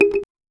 african flavored tab swit

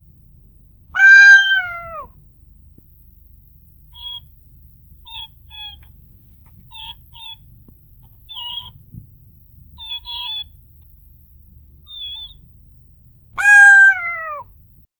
goat and cat making sound, bleating

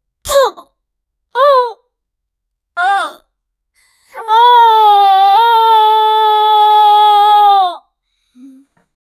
teenager hurt sound; injury

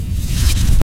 big smooth explosion of s, smoky

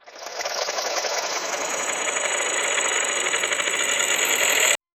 shiny spinning transition

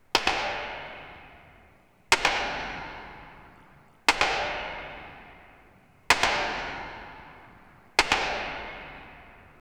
realistic sound of distan, mining

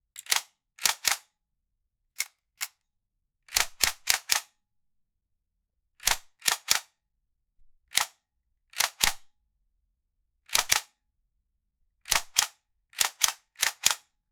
realistic ak47 shooting s; gunshots

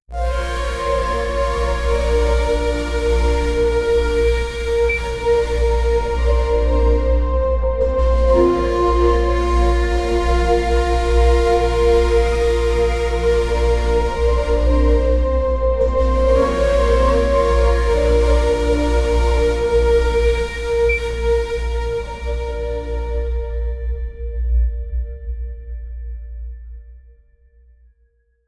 dark orchestral and unset

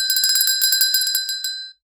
a fantasy little bronze, bells

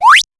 swoosh as a pixel rpg

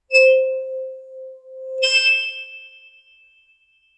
glass harp sound

